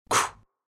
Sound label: blockstun